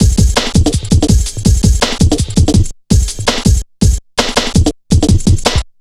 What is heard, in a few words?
165, drum loops, drum & bass